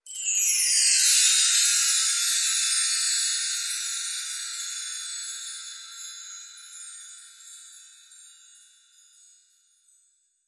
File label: Fast Chimes Roll